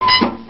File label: squeak